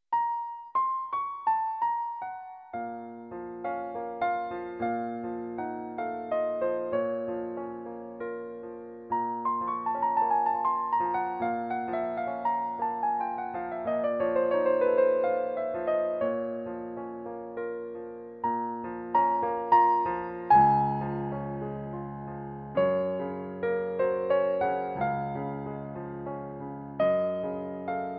chopin

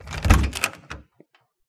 opening door

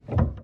piano

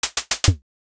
ally wind attack

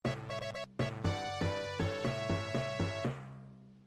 extra life; sonic

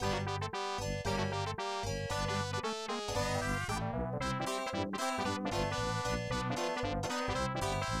ending; earthworm-jim

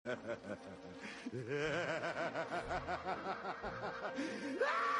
joker laugh; batman

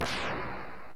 buster shot; mega-man

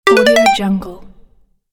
Game Start

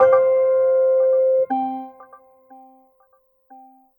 Ringback

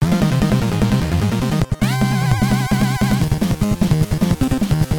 boss battle; tmnt

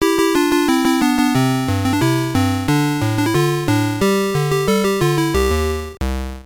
ending; donkey-kong

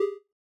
warning

